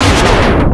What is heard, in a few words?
water cannon off; svencoop; keenhalloween